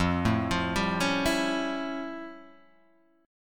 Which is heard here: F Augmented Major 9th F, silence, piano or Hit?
F Augmented Major 9th F